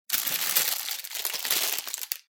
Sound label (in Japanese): 食材を漁る音